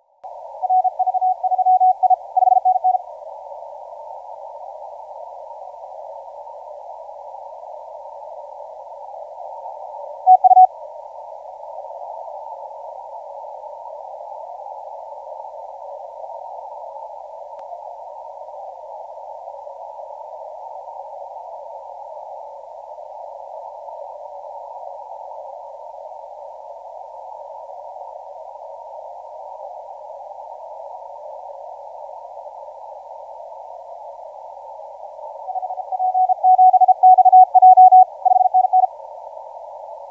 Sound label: 12m